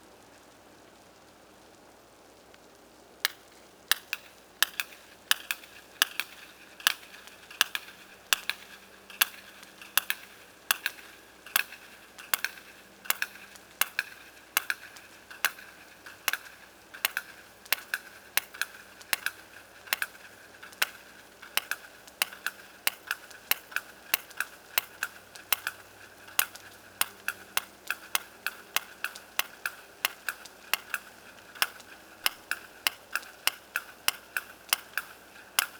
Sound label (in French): cacha